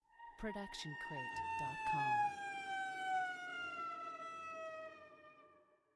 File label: Cello Bends Gliss; SoundsCrate